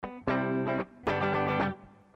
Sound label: Guitar